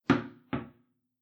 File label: Tennis - 2 Ball Knocks; Tennis Sound Effects; Sports Sound Effect